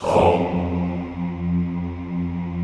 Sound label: HOMMM F1 L, Extended Classical Choir; Best Service